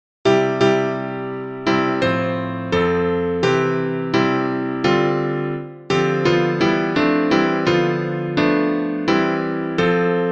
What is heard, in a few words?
Viola